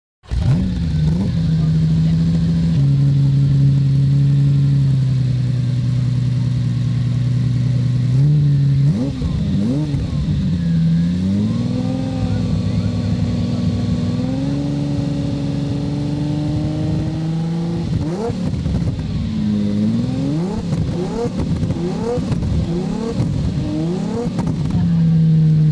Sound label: Exhaust Notes 275 GTB